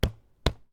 cub seat tap; anemoi

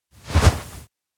head on belly; tsumire; jet; anemoi

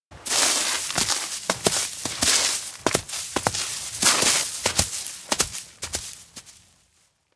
steps outdoor forest run drag foot; jet; anemoi

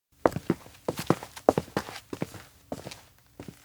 steps outdoor observatory search; awayuki; anemoi

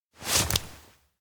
handshake; koyomi; anemoi パッケージ版 1.1

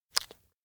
forehead tap; anemoi

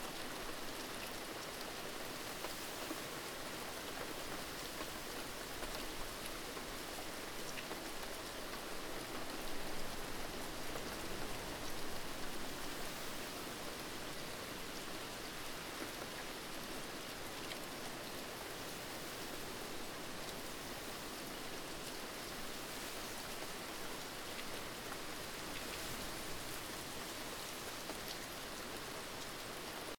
outdoor school heavy rain

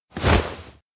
situp sudden; anemoi

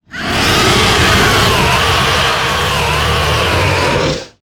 creature death; anemoi

